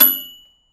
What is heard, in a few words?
ding; PonPonChun; android